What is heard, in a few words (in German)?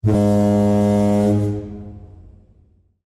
horn kurz, TUI Cruises Melody